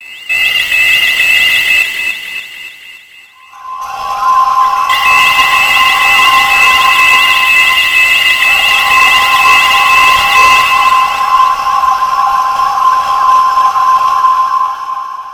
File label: alarm cut fizz